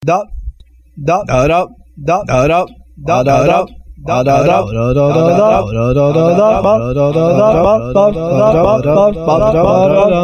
voz; ecosmetria 2004

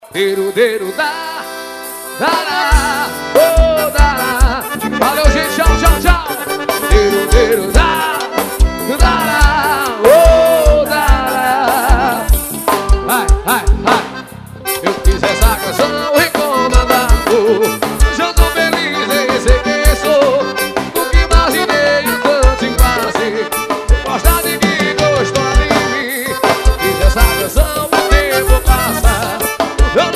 forró; Abaiara CE

